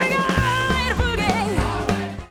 Automation Underpowered Consonants Automated, Mixing Lead Vocals